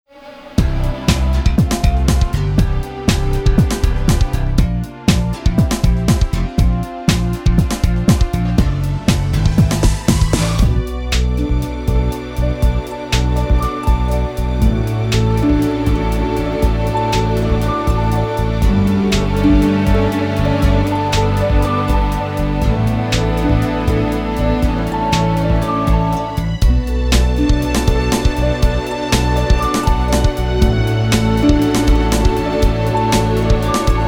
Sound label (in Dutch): tune lang; AVRO; televizier